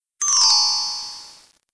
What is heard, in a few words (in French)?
quiz succes; MOBICLIC